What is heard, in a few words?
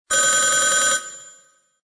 Old Ring; midi